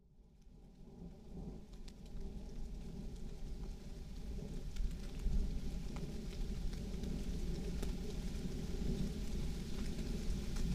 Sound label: thunder shower